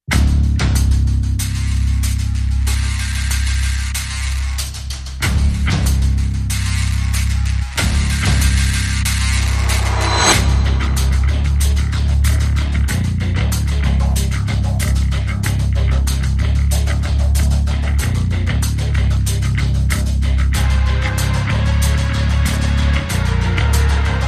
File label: Bass and Percussion Only